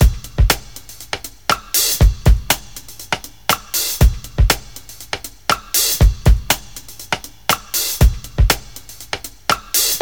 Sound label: 120 Rim Clix, Breaks; Lockdown Breaks